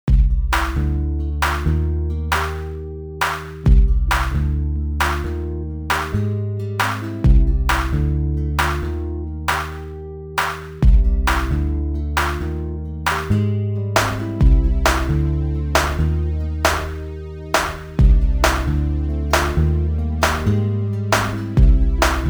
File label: C MAJ 134 bpm